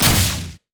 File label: spiritrush; LoL; ahri